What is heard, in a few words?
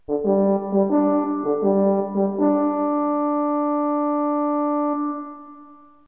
Signature Sting; Clue; Miss Scarlet